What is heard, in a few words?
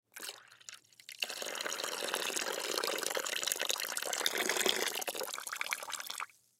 Aluminum Can Pour Out Liquid; Pouring; Objects; Blastwave; Foley